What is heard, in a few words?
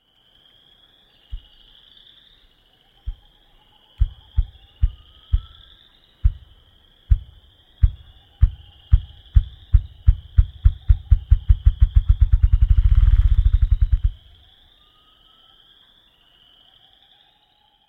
ruffed grouse drum